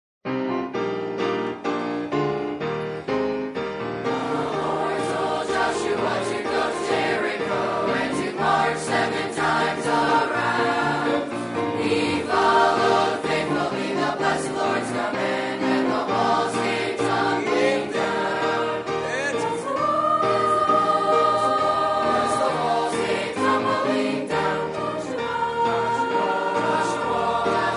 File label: Teen Choir